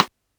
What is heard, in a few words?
RIM, Yamaha DD-6